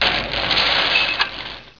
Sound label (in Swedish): Flagga